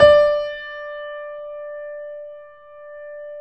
D 4, Keyboards Acoustic, GRANDP ST; USB Soundscan vol.17

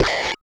Drums; Distored Reality

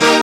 SYN TSCHAMES, dance synth; Sound & Vision - Gigapack I CD 2 (Roland)

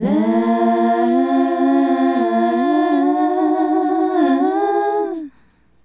Female Group, Hip-Hop and RnB Vocals

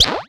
NOISE

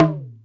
Talk Down, Alesis D4 drum machine

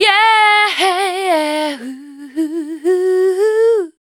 FEMALE, Dance Vocals

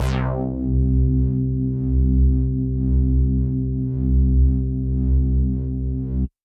Synth Prophet Bass C; Electronisounds

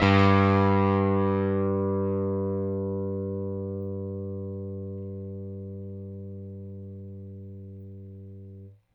Rickenbacker Bass; Airwindows

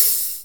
HH hh; Best Service Gigapack 2

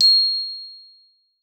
C6, GLOCKENSP S; Xsample VOL-4

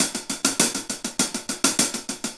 Hi Hat Pattern; Percussion; Essential Percussion; Hi Hat Patterns

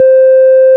ORG, Farfisa; Roland LCDP09 Keys of the 60s and 70s 1; Farflt DBars

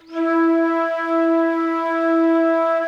C.FLUTE E 4, cross flute

